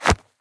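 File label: short punch in the air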